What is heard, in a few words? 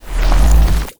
magic